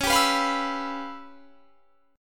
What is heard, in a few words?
C sharp 7th Sharp 9th Flat 5th, chord; C#7#9b5; strummed